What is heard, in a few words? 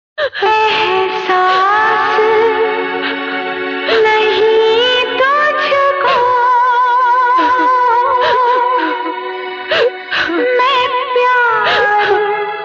Sad Female Song Ringtone; ringtones